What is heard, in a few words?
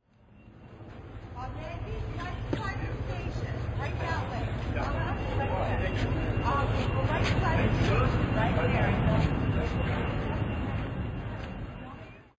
train crowd